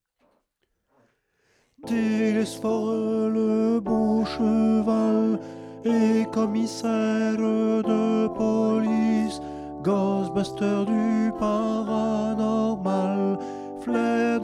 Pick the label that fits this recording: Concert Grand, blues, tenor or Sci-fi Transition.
tenor